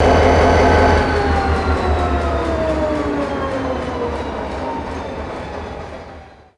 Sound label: EMD SD40-2 engine shutdown; atsf